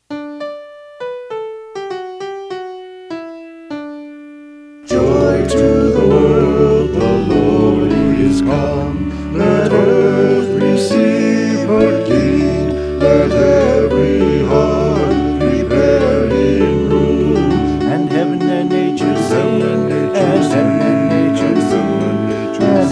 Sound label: joy to the world with vocals